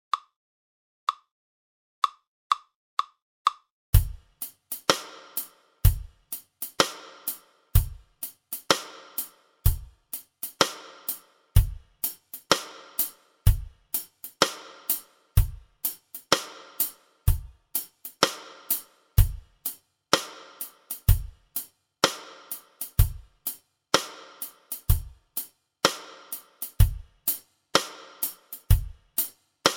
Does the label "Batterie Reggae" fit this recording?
Yes